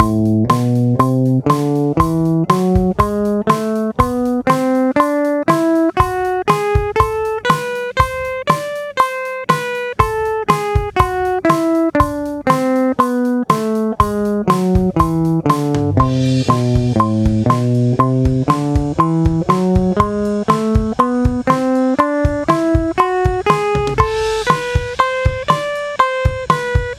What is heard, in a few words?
A minor Melodic #1 120 quarter, guitar